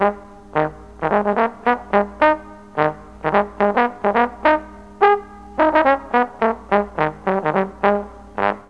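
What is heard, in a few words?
C#4 dixie trombone, Mellotron; Mike Pinder - Mellotron Archive CD; DIXIE+TRMBN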